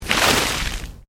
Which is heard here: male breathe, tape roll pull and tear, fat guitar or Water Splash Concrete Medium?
Water Splash Concrete Medium